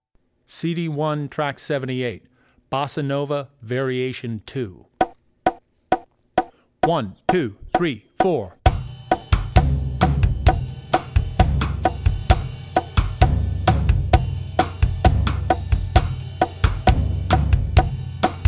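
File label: Bossa Nova, Drum Tracks; Bossa Nova (Variation 2); Drummer's Bible Tracks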